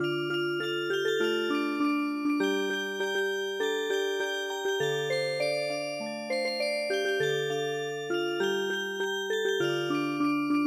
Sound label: Music Box, traditional; The Ox driving Song